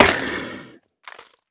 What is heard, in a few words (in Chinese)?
海龟死亡声